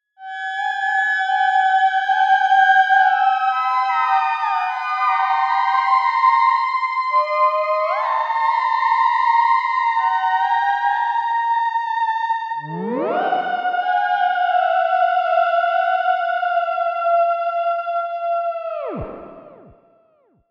Playful motifs that stretch the tonality a little bit too far, Miniature Theremin; ringtones